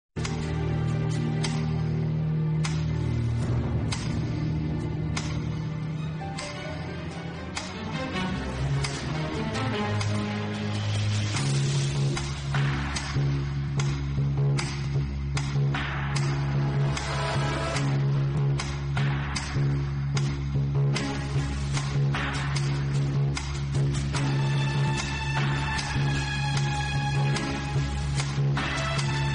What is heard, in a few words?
bgm